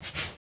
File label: train; madcars2; radicalplay